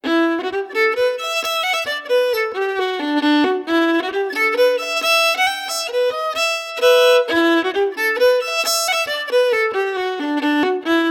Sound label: polka; boeuf trad